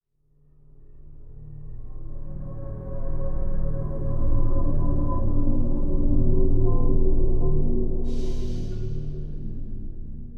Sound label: music - mystery - ambient house; You Are Empty